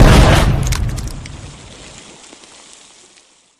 pop; flood; carrier; predatorcz; halo

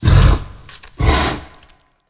idle, dragon

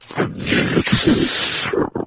death, flood

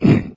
pain, flood

